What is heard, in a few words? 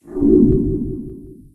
portalgun teleport; minetest